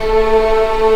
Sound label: ANG.V A2, ANGELIC